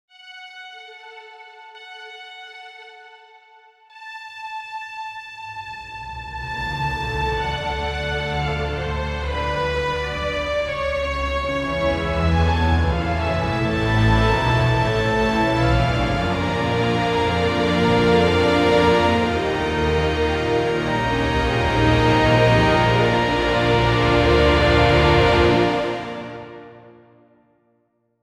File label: epic orchestral score